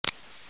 safety, m4a1; mw2

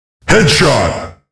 headshot

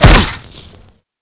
zeus hit; czero

